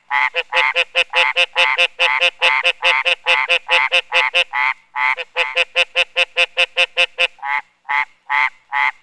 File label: frogs spatial loop; spacial loops